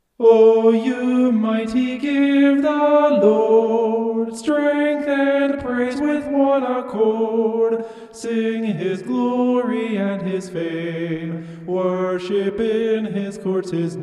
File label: vocal homoph; psalm 29